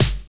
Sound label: SNARE